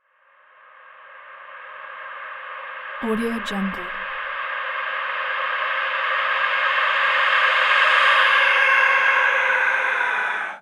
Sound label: scream; human sounds